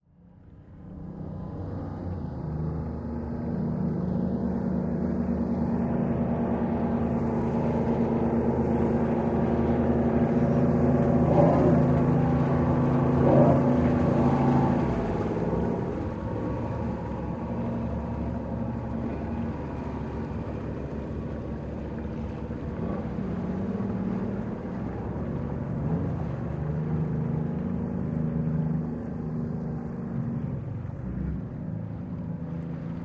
Military Pbr: Cicling Offshore Medium Close-up, Medium Speed. Wake Laps On Shore. Stereo